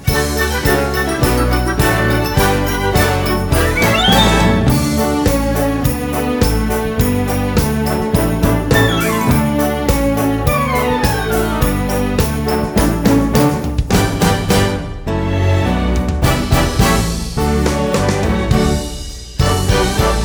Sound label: Jingle Bell Medley Up On The Housetop no vocals